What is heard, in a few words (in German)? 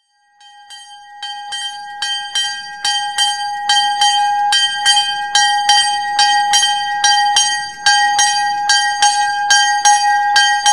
Glocken